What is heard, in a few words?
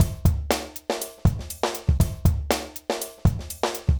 120 Pop Drums A, Fast Tempo; Pop Rock; Digidesign; Pro Tools; Pro Tools Essential Loops